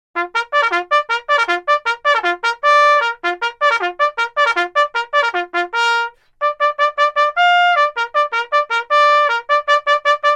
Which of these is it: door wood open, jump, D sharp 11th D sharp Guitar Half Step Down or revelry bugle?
revelry bugle